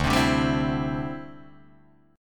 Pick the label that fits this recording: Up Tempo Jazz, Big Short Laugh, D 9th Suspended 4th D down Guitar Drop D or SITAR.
D 9th Suspended 4th D down Guitar Drop D